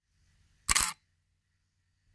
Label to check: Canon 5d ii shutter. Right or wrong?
Right